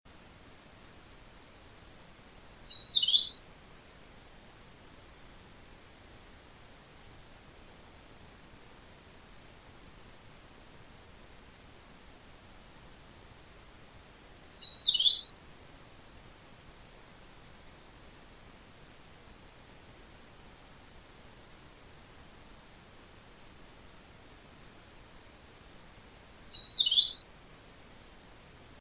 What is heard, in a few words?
Other Warblers; AZFO; Thompson; Madera